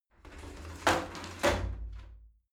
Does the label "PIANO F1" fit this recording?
No